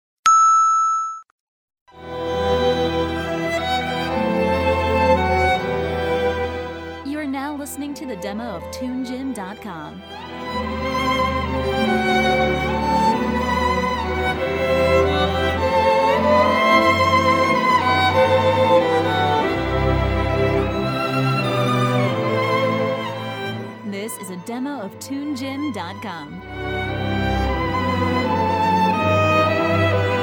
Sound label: Violin fantasy; Tunegym